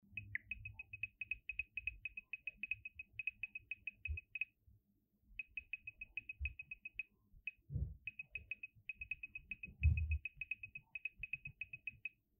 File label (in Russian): Звук стирает текст на android